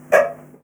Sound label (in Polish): chwytanie; apocalipsis